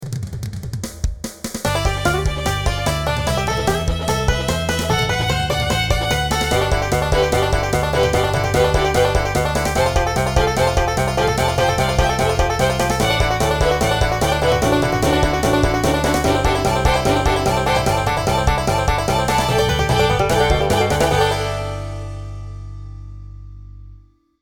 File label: Chiptune